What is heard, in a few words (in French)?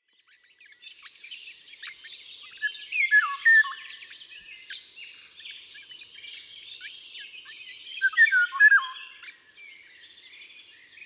loriot d'Europe